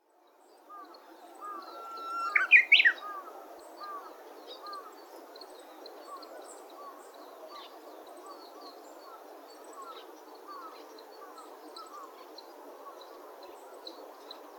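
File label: uguisu, Japanese bush warbler; watarase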